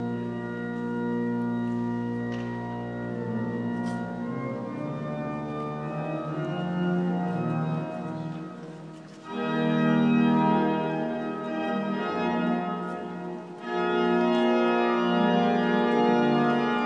cathedral organ; salisbury cathedral

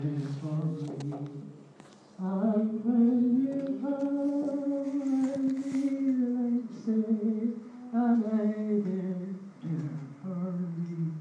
singing; at his own 90th

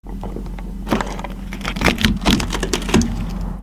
painted wood

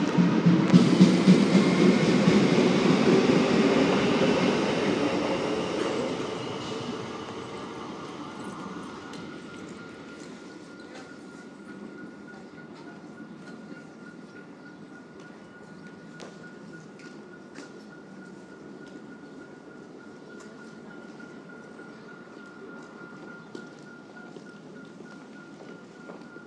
yarraville station 7 40am

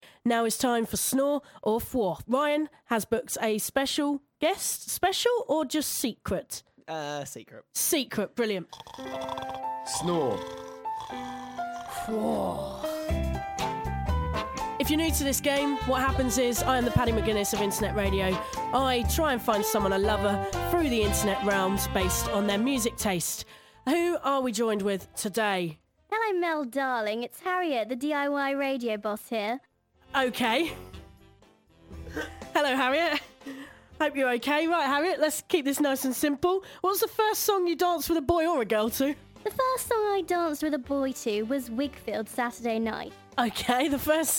snore

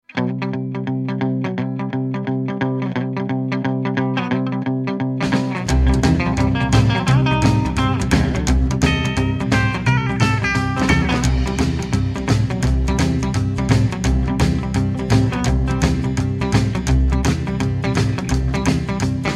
backing track sample

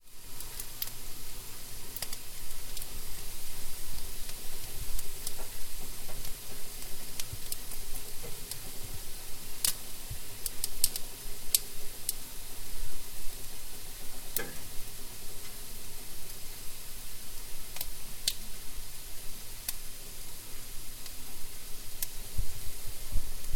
grilling some bacon

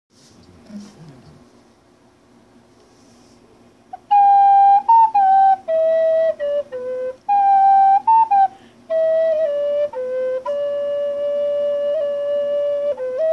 native flute playing

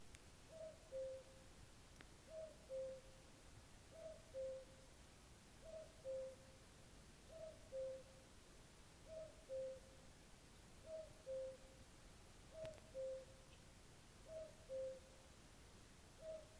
cuckoo at 3 30am

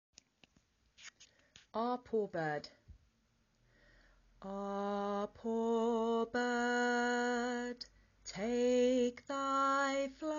ah poor bird

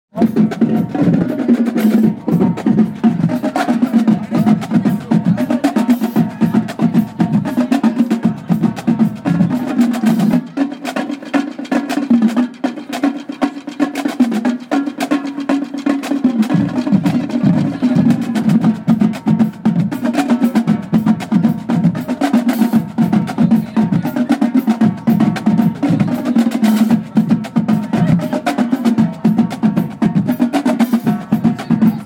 drummers

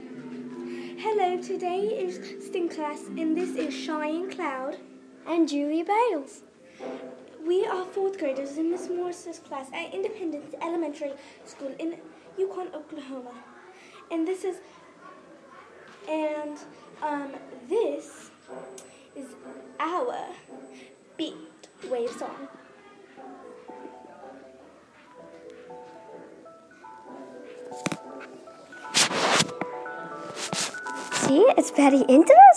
beat wave song; An episode by IES STEM Class

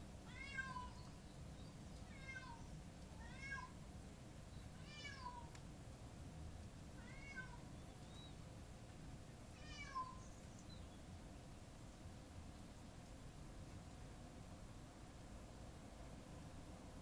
poor cat miaowing outside in the rain

